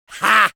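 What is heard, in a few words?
laugh short, French; Rire court 1; Medic; Commandes vocales du Medic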